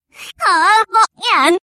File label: clock, reverse